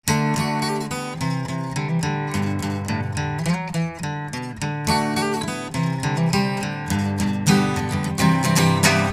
Guitar; Guitar Cat; Mellow Island

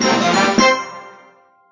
Win deluxe

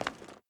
rock footstep; Adam's Venture Origins

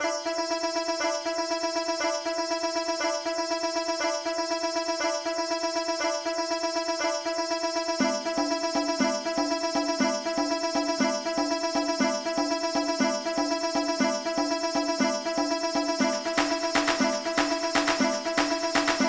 Desert; Spectrum

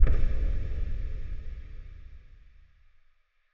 CROWD SURROUND Light On; City SFX

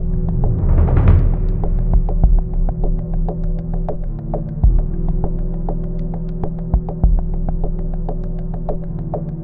Dark Loop; Chernobyl Underground

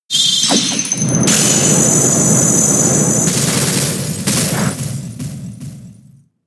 Icicle Crash; Pokemon; gen 6 attack moves; XY ORAS

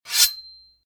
Sabre Throw; 3DS; Xbox 360; gamerip; doodle jump; android

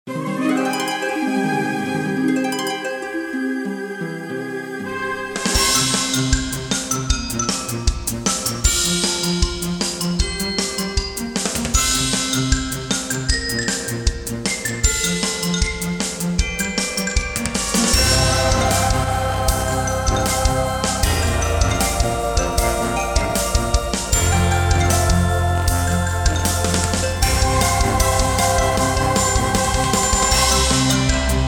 Instrumental Theme; Super Cane Magic ZERO

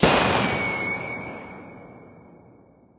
Rocket blow

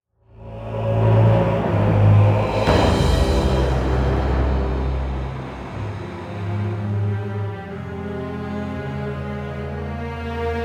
Combat Ending; Prince of Persia The Journey Begins; City Of Light

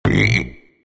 Frog Stomped; gamerip; The Fancy Pants Adventure World 3

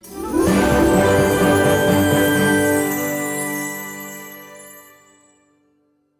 win boss jingle; Dragodino

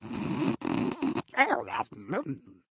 Prehistoric Sleep; Cut the Rope: Time Travel

